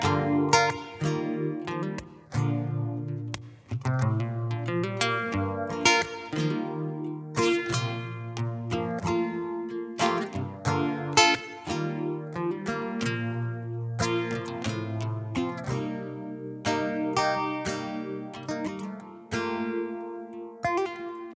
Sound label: Phaser